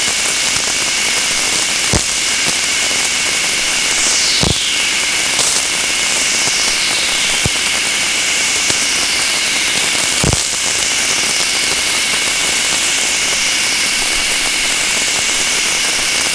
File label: VLF